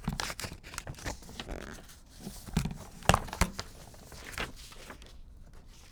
Shuffle Plastic; Micro Pack; Paper Cutter

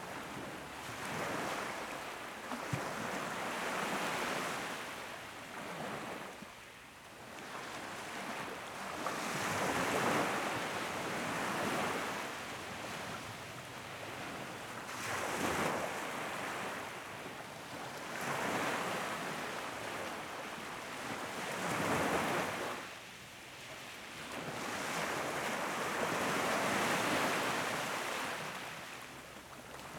Ambiance Sea Loop Stereo; Nature; Essentials Series NOX SOUND

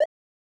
selection, 8 bit sound effects; Main menu

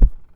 Beefy Thud; BB Retail Therapy Sample Pack

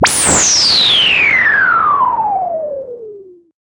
Noise Sweep Down; FREE SFX PACK VOL 3

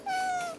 Cat Meows - Food Time; Micro Pack

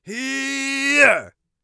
heavy attack, Warrior Voice; Warrior Voice Pack

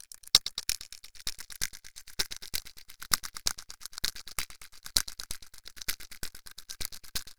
Plastic Dice Toy Rubbing Sci Fi

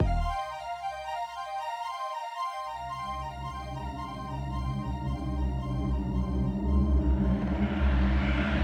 Echo Delay

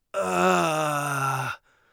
bleeding, Warrior Voice; Warrior Voice Pack

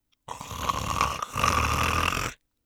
sleep, orc voice; Orc Voice Pack

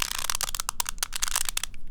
Small Cube - Squeeze, Rubik's Cube

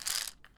Roll, Pill Bottles; Allergies

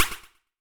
menu; Free SFX for anything

